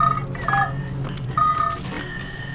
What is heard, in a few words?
COMPUT; Best Service; Advanced Mega Effects